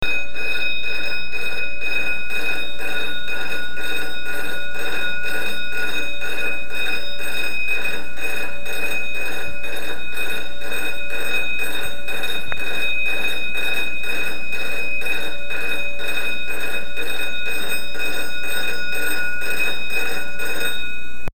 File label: Fire Alarm; Simplex System; High School